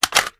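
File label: assault rifle reload mag out; viper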